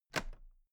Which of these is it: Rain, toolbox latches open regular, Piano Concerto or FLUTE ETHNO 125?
toolbox latches open regular